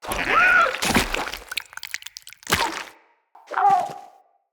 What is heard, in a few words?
Stuck Beaver; Encounters; Animal Rescue